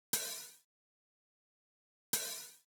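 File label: Open hi-hat; hydrogen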